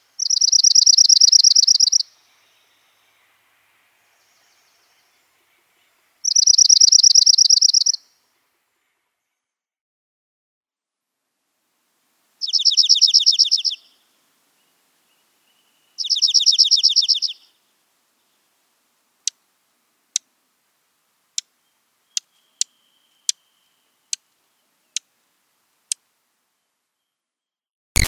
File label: Dark-eyed Junco (Oregon)